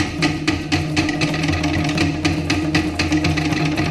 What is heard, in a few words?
Polynesian Drums